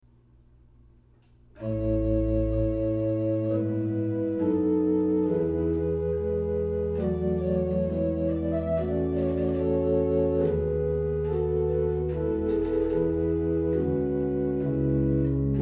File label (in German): orgelb; Bach